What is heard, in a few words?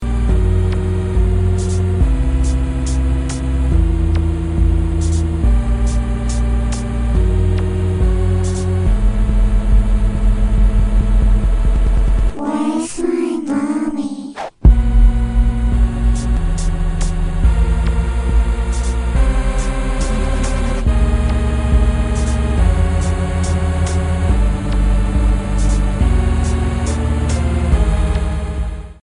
nightmare techno ringtone